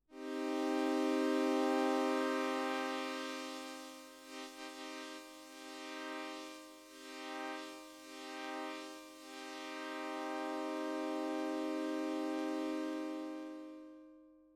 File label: MOOG MATRIARCH Parallel HP